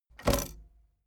tape deck bounce

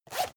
zipper use short fast

